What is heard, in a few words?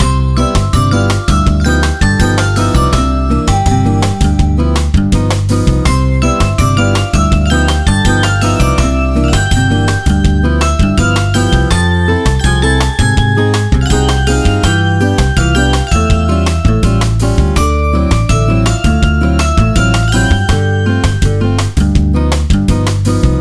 bossa, bgm; pictcode